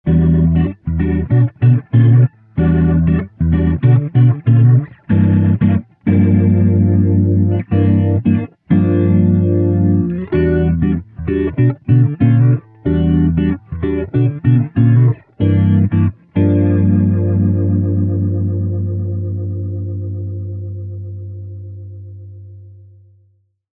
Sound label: rotary; mobius